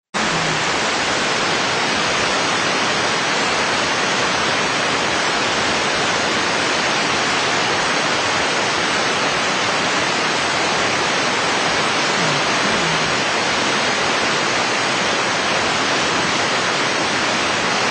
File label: static